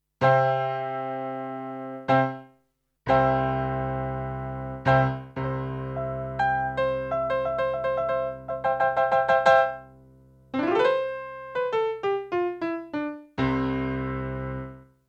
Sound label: Tech 21 QStrip Off Roland Piano